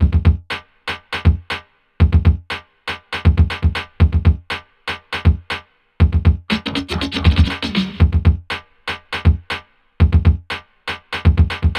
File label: Music Robot wife Robo dance